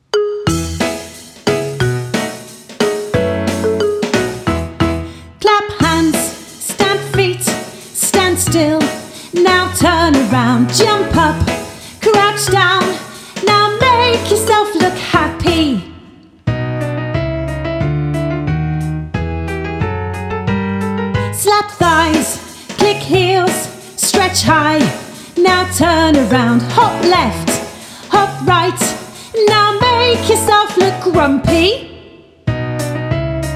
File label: Guide Vocal Track